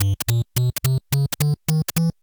bonus, music; superbroswar

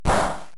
not very damage; Pokemon Essentials; Pokemon Iberia V1.03

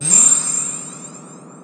Chill; easyrpg; Ib

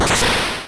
Battle damage super; Pokemon Essentials; PKMN Estelar 1.3

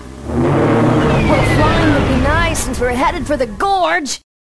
WHIP PAN; Full Throttle; ScummVM

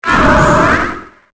Cry

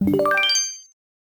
gain coins; Voltorb Flip; Pokemon Essentials; PKMN Estelar 1.3

